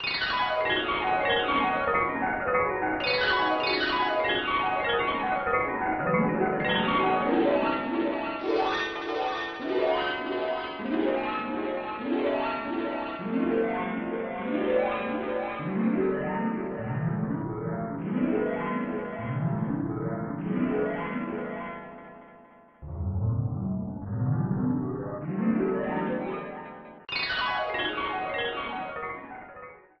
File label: Falling Star; Mario Paint Prototype